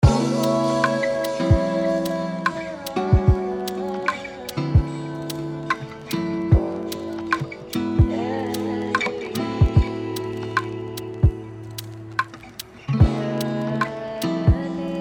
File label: Tombak